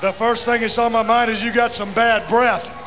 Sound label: b breath; The first thing on my mind is that you got bad breath